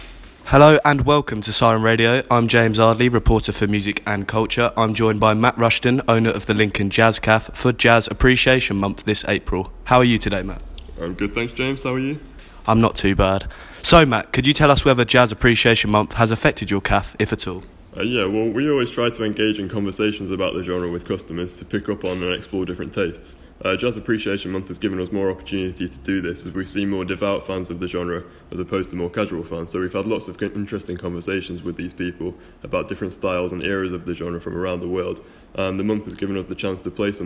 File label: Jazz; Jazz Appreciation As Live